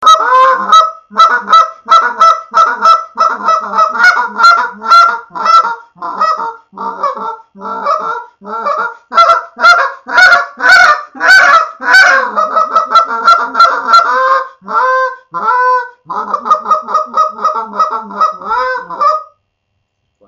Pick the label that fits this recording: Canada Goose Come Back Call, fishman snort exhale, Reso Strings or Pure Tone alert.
Canada Goose Come Back Call